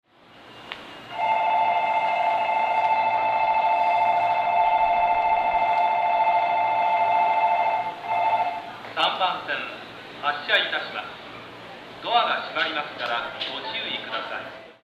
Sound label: bell; 3番線（山手外）発車ベル; ueno; jreast yamanote